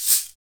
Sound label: PRC SHKR